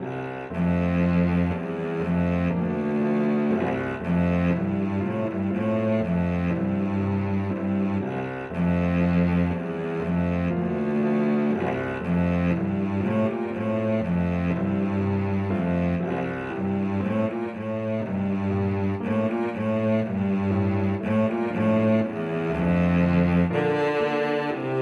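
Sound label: violoncello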